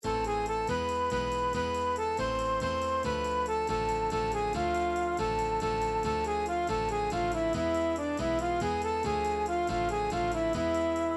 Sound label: Folk Bulgaria Hora, midi; Chukav Chukav Male